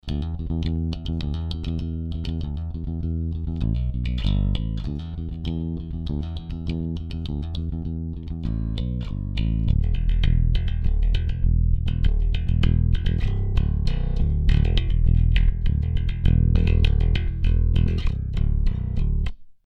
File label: fingerstyle